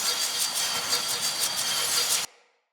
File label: Dagger Recall Loop; Weapons; Player; Swords; Fey